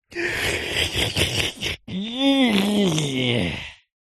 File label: cartoon yawn man